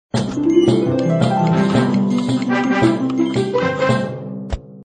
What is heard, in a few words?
music box; Zumwhere music box